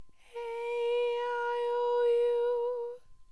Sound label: aeiou High